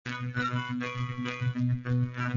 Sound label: funky loop